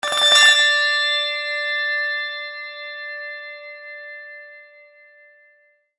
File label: receive present; greevil